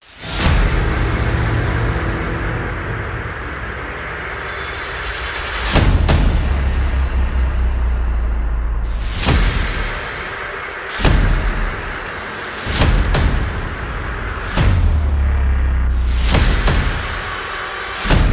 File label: Percussion and FX